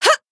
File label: Voice attack; suzette